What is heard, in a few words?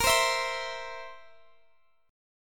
A sharp Suspended 2nd Flat 5th, down, Guitar, Half Step Down